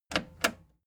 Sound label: plastic button slack click slow